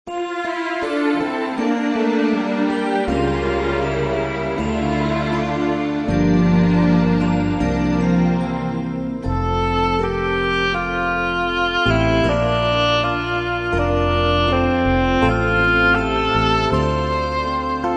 Instrumental Hymns

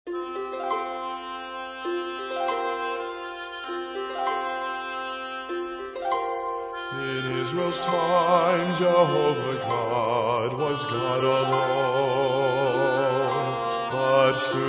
Trio, Break Down the High Place

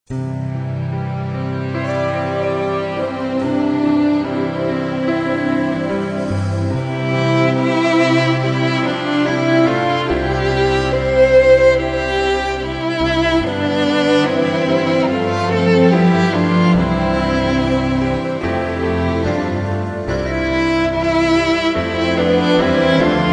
Instrumental Hymns

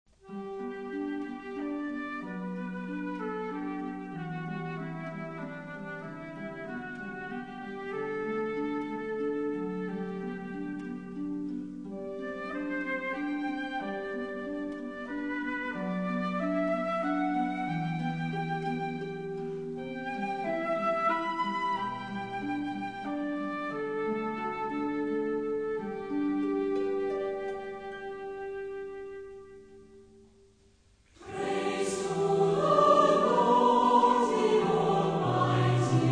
Orchestra and Choir, Hymns

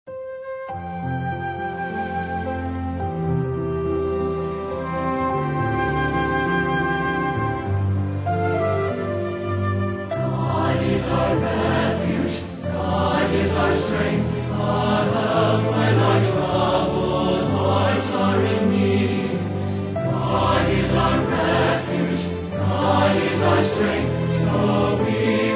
Singers And Orchestra

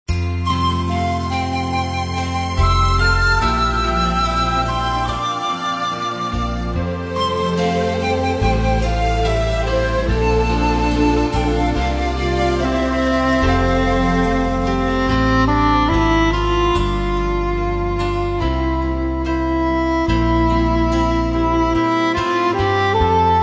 Instrumental Hymns

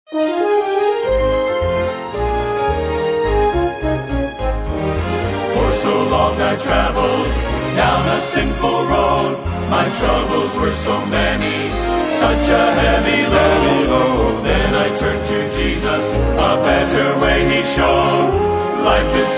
Youth Dept, Victory Road

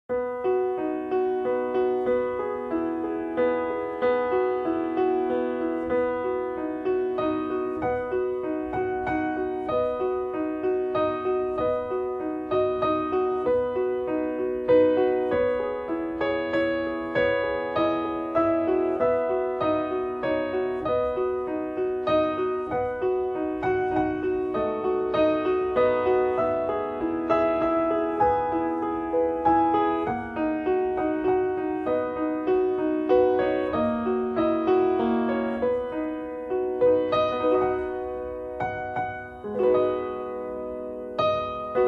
piano solos

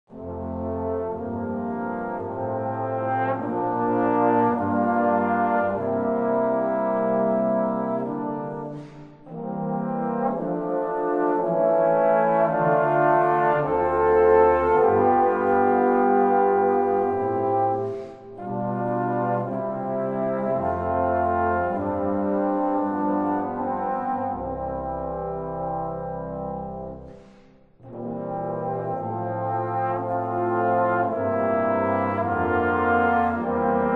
brass ensemble

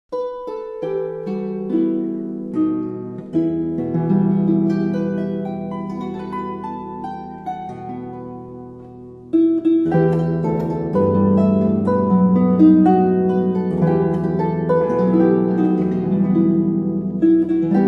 sing noel, christmas carols on the harp

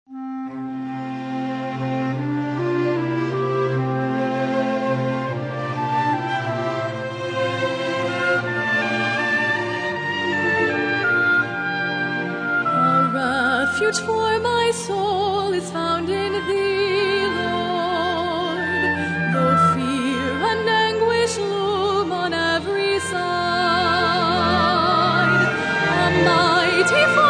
Singers And Orchestra

